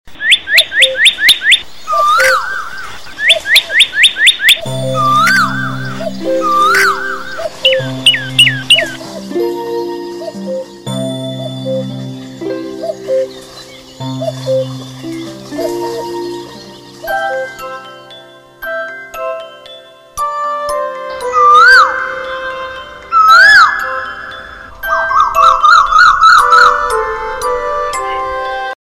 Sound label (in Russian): kukushka i muzika